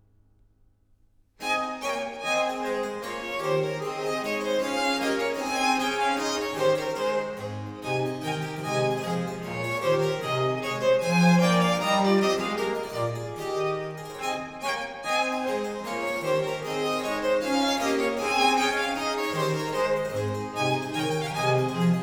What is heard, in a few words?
Sonata in A major, Gavotte. Allegro, Trio Sonatas; Handel